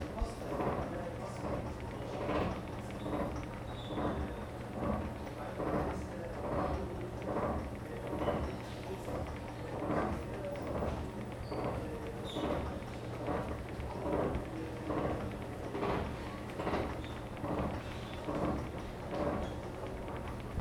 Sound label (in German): Rolltreppe